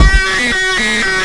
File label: lil kid cat scream